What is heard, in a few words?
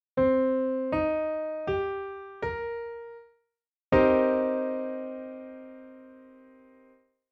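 chord piano m7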